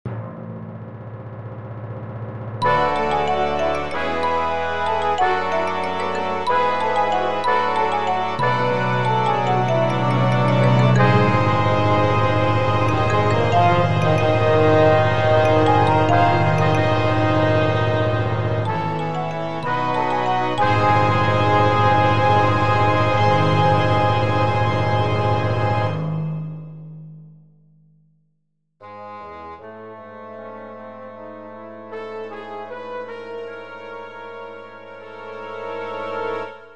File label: Fanfare